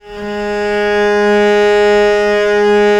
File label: VL G#2, VIOLE, Historical Instruments; USB Soundscan vol.23